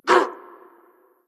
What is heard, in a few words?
hitreact human male small